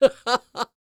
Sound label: LAUGH